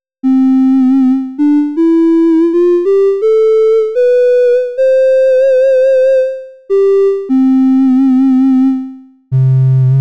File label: FLUTE